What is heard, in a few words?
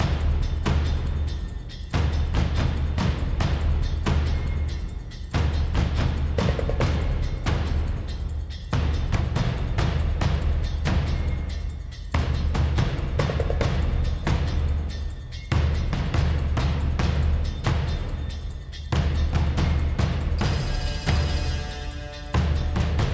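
Percussion loop; Medina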